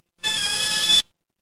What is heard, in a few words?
animal insect